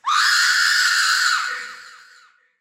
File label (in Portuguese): menino mordido por burro grito